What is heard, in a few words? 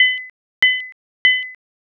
radar